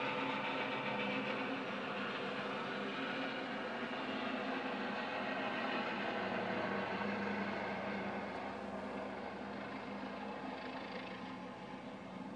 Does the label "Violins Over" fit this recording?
No